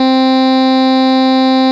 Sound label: bass, SQ80